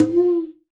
Conga Thumb; Roland BK-5; Percussion

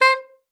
C5, ALTOSAX; Xsample VOL-2

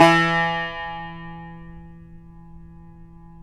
yayli tambur; Spectrasonics; Hans Zimmer Guitars VOL-2

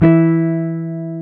Jazz Guitar

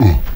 LO REZ OOOF; AMG

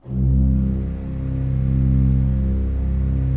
C1, Cathedral Organ; ILIO; Propeller Islands

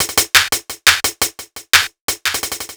Hi-Hat Clap Combo, Hi-Hat Loops; KJ Sawka - Drum n Bass & Beyond; Drum Tool Box

